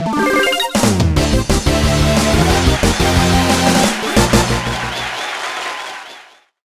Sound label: Jingle Victory; Photo Dojo; DSiWare